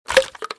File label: insert, 9mm AR; weapons; halflife